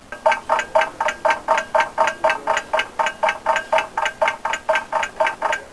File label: C4 Clock; blobAndConquer; combat